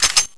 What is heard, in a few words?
mp5 slide back; svencoop